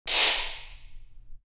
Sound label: Ratchet single round